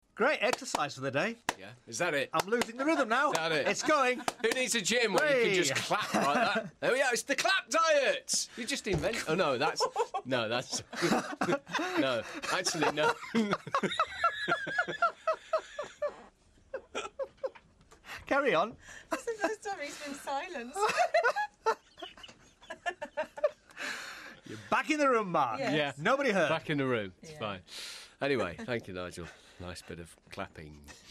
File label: clapping